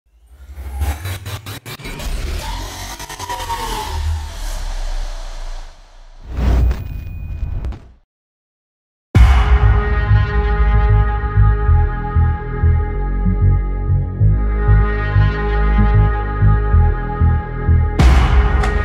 hard motivational rap beat epic uplifting type

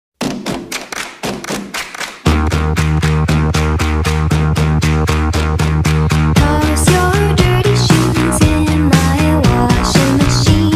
sped up nightcore

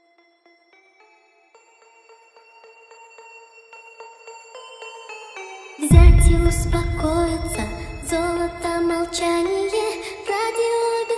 hardstyle remix

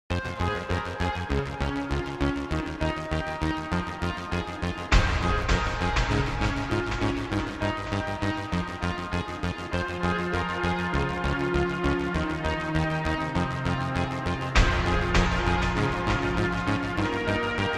slowed reverb

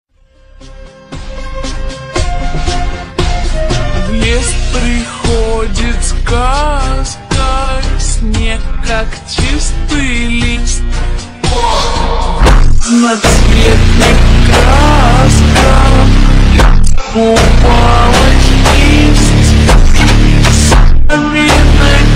ultra slowed

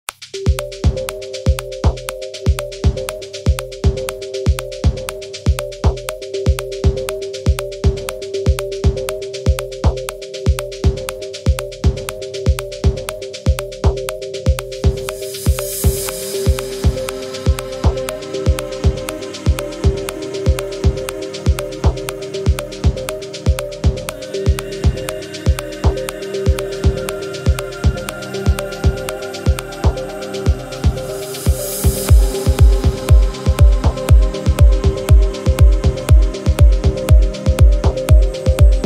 afro house remix

